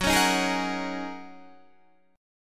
F sharp 6th Add 9th F sharp Guitar DADGAD

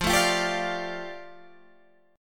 F 6th Add 9th F down Guitar Standard, chord; F6add9 strummed

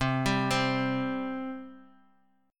C 5th C down; C5 arpeggiated

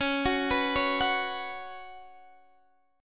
B Suspended 2nd, Db, chord

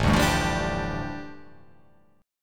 A 7th Suspended 4th Sharp 5th, A, down

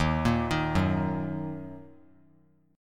Eb Minor Eb 3C 3F 3A 3D; Ebm arpeggiated; JGuitar

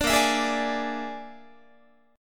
Bb 7th Flat 5th Bb, Guitar; Bb7b5 strummed

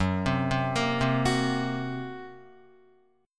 F sharp Major Flat 5th, Guitar; arpeggiated; 1,3,0,3,0,2; 4F,4A,5C,5G,5C,6E

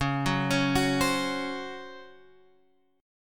C sharp Major 7th Suspended 4th; x,x,x,6,6,8,8,9

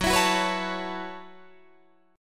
Gb Minor 11th Gb Guitar DADGAD

